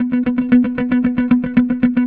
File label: guitar, stringed instruments